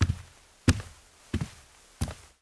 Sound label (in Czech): chuze koberec; Mafie 1 cz